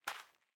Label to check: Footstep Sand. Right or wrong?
Right